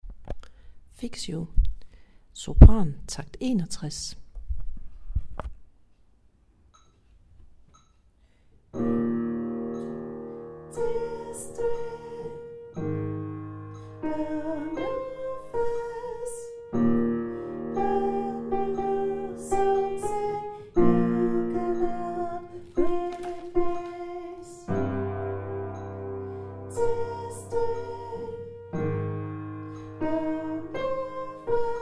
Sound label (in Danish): Sopran